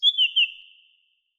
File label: song thrush; song thrush 1